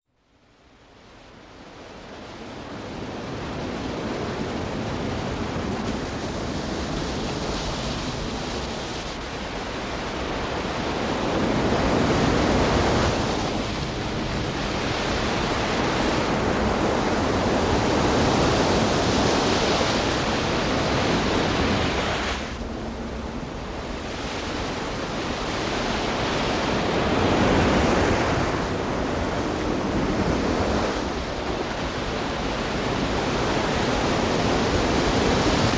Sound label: Psychologically Ultimate Seashore Environments Reversed; Irv Teibel Syntonic Research